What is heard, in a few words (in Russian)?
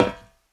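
тихий металлический звон от удара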